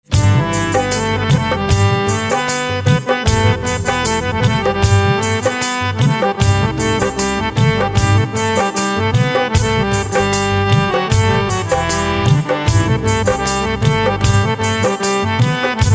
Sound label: Harmonium